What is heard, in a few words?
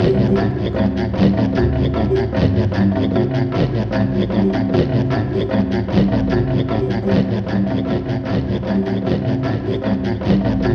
C3, Synth Line; 90 s Sample CDs; NiceBeats; SynthLine VOL-30